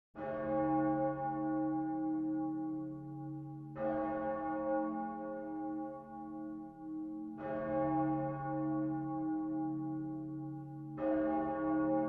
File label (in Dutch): Klokgelui